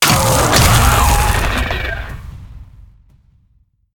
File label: Boss Die Ice; wildworlds